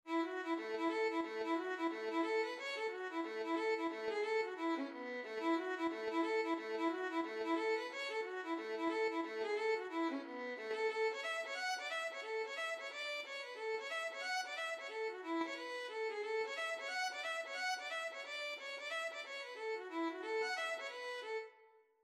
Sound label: violin